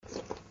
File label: Suck; Lewd SFX